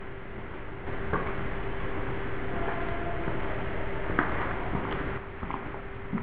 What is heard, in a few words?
singing, evps